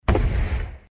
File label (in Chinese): baozha; 音效